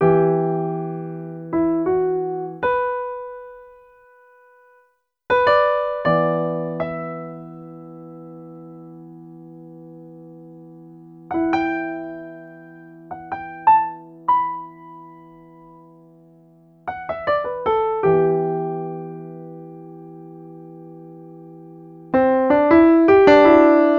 Hi Piano Fills, Electro Lounge; Sonic Foundry (Sony Creative Software)

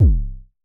Kicks C1; Vermona Kick Lancet; LookIMadeAThing Kicks; Festival Kicks; Drums

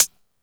Hat, Yamaha DD-20

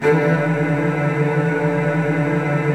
STR WHL TRLL, Virtuoso Strings; ILIO; Kirk Hunter Virtuoso Strings VOL-3

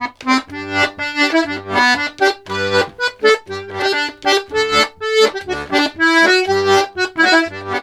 POLKA, Accordions; USB Soundscan vol.40; AKAI

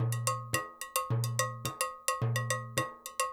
NYC Percussionworks loops 108; Sampleheads

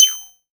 Shard Hat, drum machine; 300 Drum Machines; DevSnd

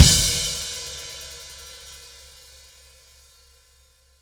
Drums Ending, Indie Rock; Session 01 - Brighton; Sonic Foundry (Sony Creative Software); Indie Rock Anthems

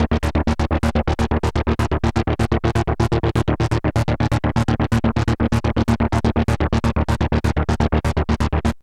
LFO 125, SYNTH SEQ; AMG; Global Trance Mission VOL-2

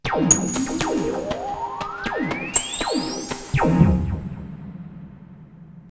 120, drumloops, DrumMachines; X-Static Goldmine

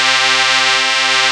DIGI 5THS

